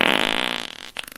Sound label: big fart